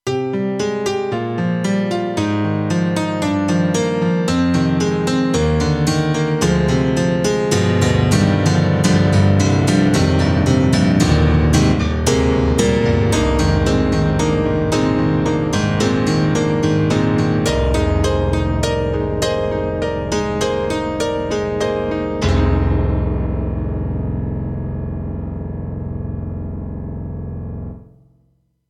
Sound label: KORG TR-Rack Compressd Piano